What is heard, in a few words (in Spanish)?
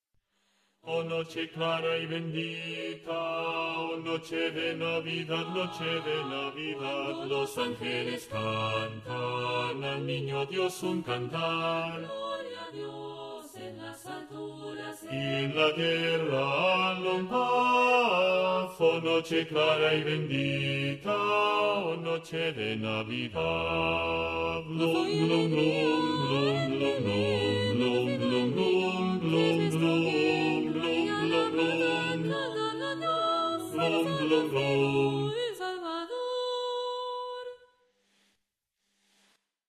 bajos; oh noche de navidad